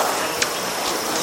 Spangled coquette

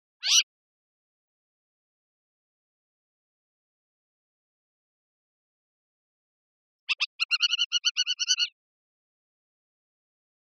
Capped wheatear

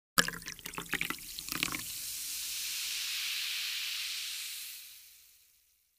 Pouring soda

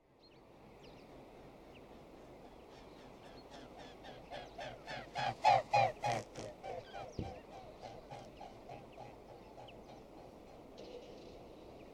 Mute swan; قوی گنگ

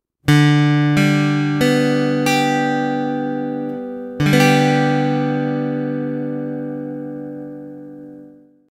Guitar notes; نت های گیتار

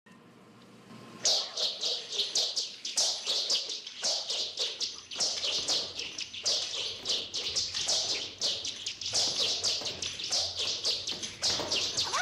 Nunchucks; نانچیکو

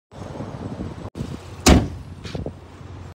capot; کاپوت ماشین

